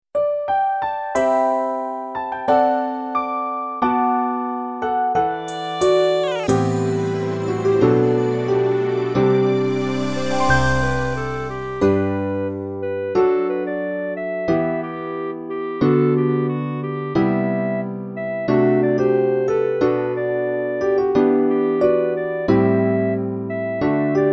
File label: Piano